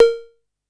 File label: Cowbell Chick; One Shots; Drums; Fenk; Ableton Live 12 Lite; Core Library